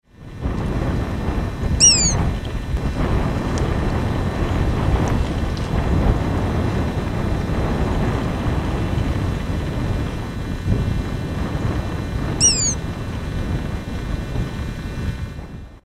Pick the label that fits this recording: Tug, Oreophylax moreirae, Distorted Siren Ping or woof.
Oreophylax moreirae